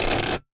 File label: war ui source rectangle magnet flick rattle